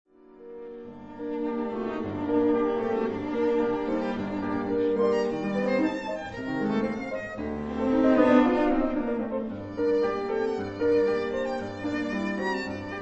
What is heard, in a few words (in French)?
Quatuor piano; Paris : Heugel, 1946